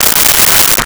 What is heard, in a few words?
Box of Push Pins Shake; Office Supplies; 1001 Sound Effects